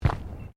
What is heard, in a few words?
FOOTSTEPS SNEAKERS PLASTIC GROUND MAT STEP